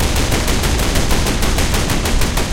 loop mk103 38

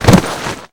bodyfall dirt; bodyfalls

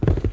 Horse Run; Civ4 warlords

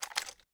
pickup gun; Rust

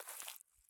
walk grass low; Escape from Tarkov

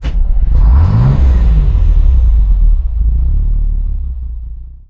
valentine engine int start, tanks; cannons; War Thunder

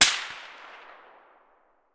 dvl fire silenced distant; Escape from Tarkov

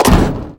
sheetmetal run

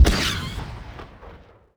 rocket ignition

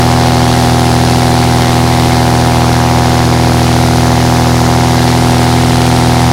morava side, engines; War Thunder

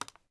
Screen Tab; Civ4 beyond sword

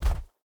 bear footstep snow hard; Rust

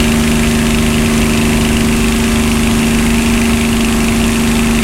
spitfire cockpit, engines; War Thunder

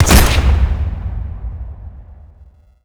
hiker 1shot cave

